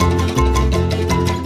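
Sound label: ocean world music cage forcefield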